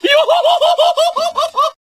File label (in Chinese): 哟笑, 笑声; 笑声集合